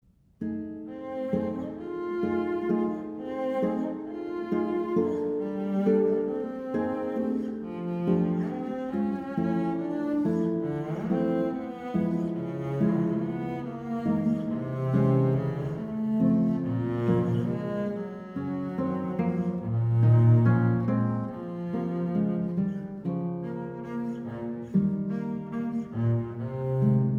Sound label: Suite for String Bass and Guitar